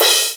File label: MUTE CRASH; Greytsounds VOL-1